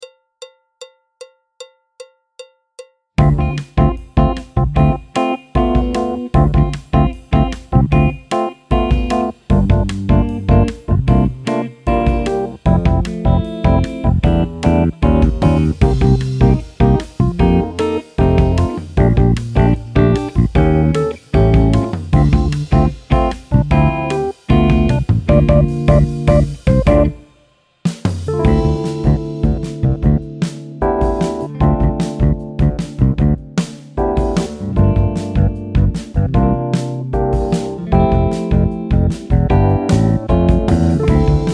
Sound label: alto, piano; Jazz Syllabus Level 4